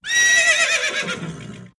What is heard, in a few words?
Horse Neigh; Epona